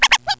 DOG; WINDOG

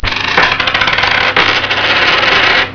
coins; 999wavs

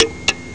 Old Clock Ticking; Couch Potato

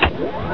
DOOR UP; ABUSE

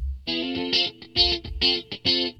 GUITAR, ROCK POP; MAGIX

